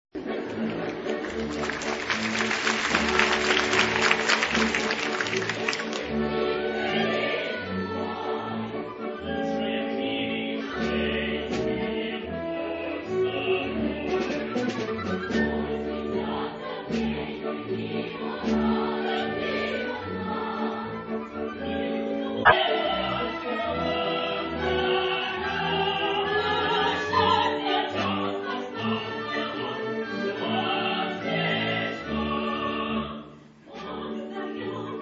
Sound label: duet, Korg